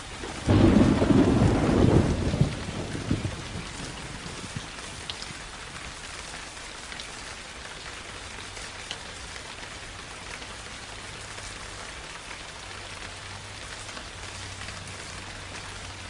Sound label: Nature Rain Sound